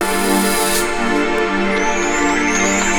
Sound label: SYNTH D+B 160 C, Drum+Bass, Keys; eJay